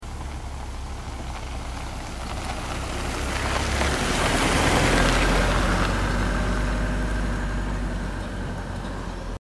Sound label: Truck and road